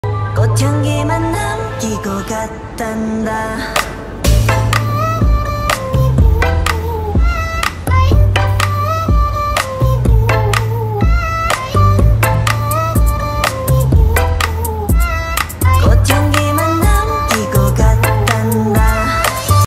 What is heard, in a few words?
english BGM ringtone